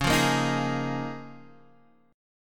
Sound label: C sharp 6th Add 9th C sharp down Guitar Half Step Down; C#6add9